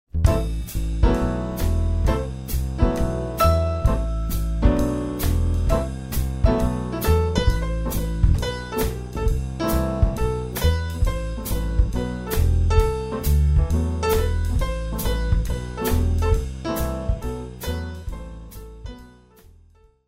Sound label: Jazz Dance